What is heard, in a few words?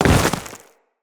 Step Ice, Footsteps; Grineer